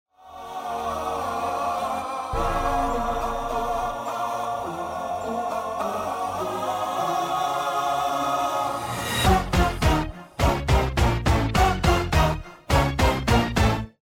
lost round; music kits custom

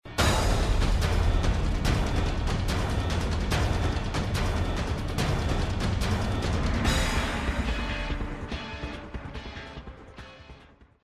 bomb ten sec count; music kits custom

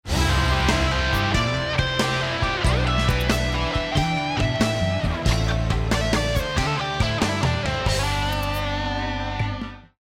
start action; music kits custom

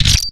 Rocket Launcher Retract; Requests Studio; UT3

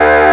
buzzer, timer

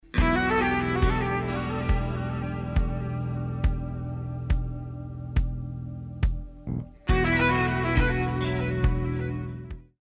round ten sec count; music kits custom

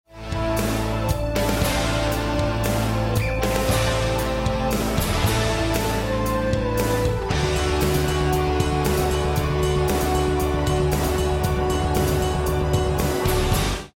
won round; music kits custom

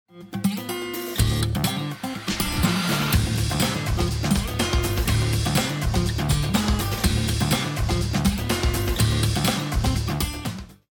bomb ten sec count; music kits custom

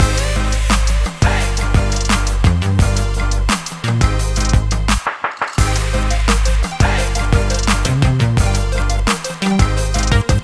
twist, emotes music; fortnite emotes

